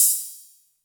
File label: Hats, Elektron MachineDrum; SPS1 MKII